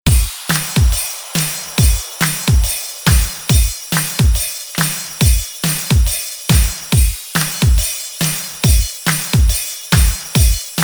Kick Beats; pack noel

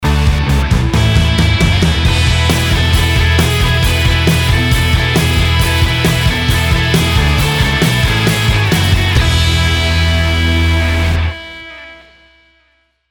Bed Rock

